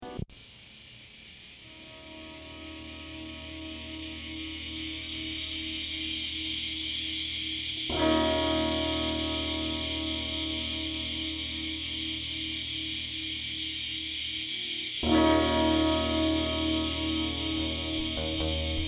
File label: Cicadas; Zirafa